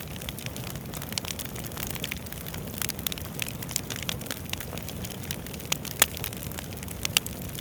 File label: bonfire; anemoi